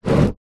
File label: plastic tub slide on top of another short; zapsplat